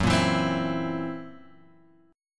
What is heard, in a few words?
F sharp 7th Flat 5th; F#7b5 Chord {2 1 2 X 1 0} strummed